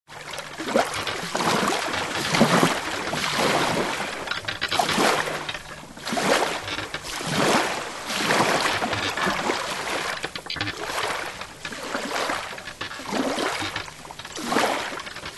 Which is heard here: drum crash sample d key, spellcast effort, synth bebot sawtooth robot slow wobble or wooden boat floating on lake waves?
wooden boat floating on lake waves